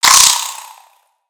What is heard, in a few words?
Spider Die; Lego; creatures mechanical